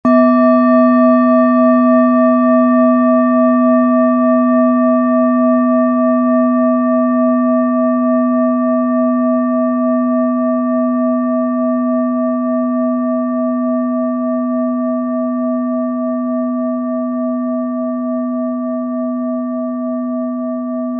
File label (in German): klangschale bronze